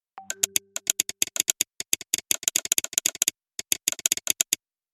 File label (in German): Sound Feedback Klicken